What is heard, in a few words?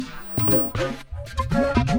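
Music Base 120; from dust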